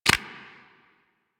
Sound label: Cancel Draw, Weapons; Warframe; Infested; Inf Cernos